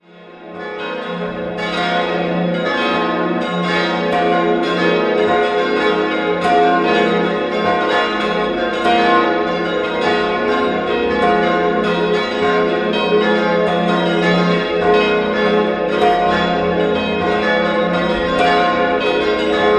Lauterhofen, Glocken

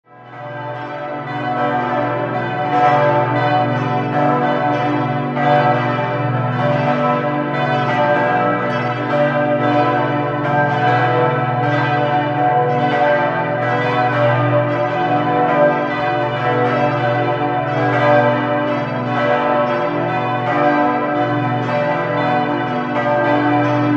Ingolstadt, Glocken; Moritz

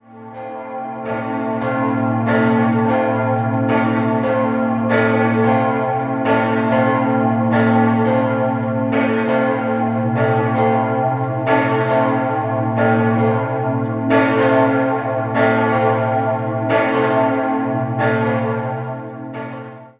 glocken Dreieinigkeitskirche; Regensburg

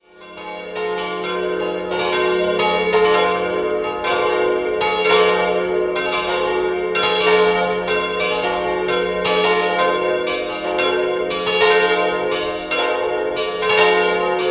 Regensburg Sallern, glocken

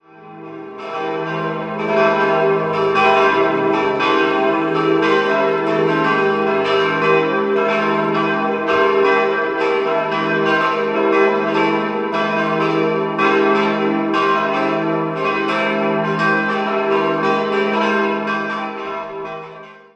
Stammham, Pfarrkirche, glocken; St. Stephanus; Wendelinglocke; 1950; Ingolstadt; Wendelin Vielwerth

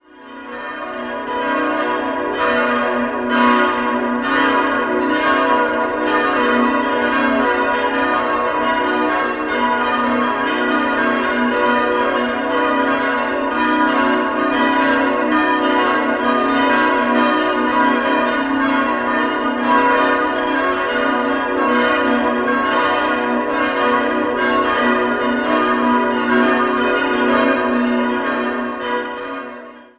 Glocken, Altenstadt a. d. Waldnaab, Evang. Jesus-Christus-Kirche; h'-cis''-dis''-fis''-gis''-ais''; im Jahr 1968; in Erding gegossen; Karl Czudnochowksy

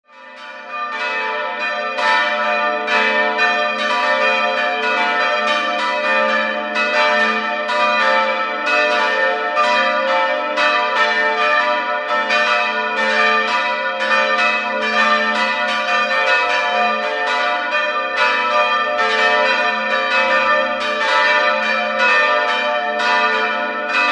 Kleinalfalterbach, Glocken

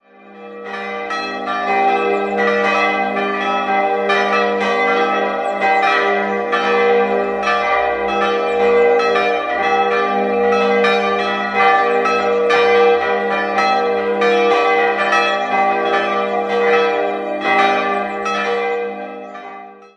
Dietldorf, Glocken

